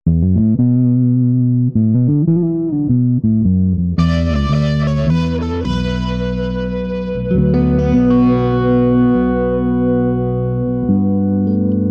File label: Jam, Swart AST, PRS McCarty; Fender Nocaster, Fender Bajo Sexto Baritone, TEO Mandoguitar; From about 1:30 you can hear the notes' distinction even with some power-tube juice; This jam features Fender Nocaster, Fender Bajo Sexto Baritone, TEO Mandoguitar